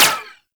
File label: Uzi Layer; Warframe; Tenno; Prime Tenno Uzi